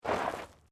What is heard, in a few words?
Bicycle Mountain Bike Slide Gravel Minor, Bike being thrown around to simulate crash impacts; Bicycles